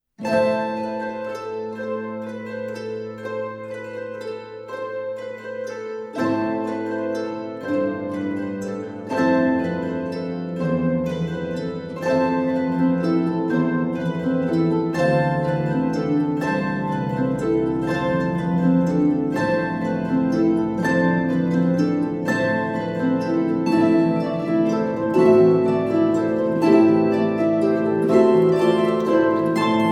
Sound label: carol bells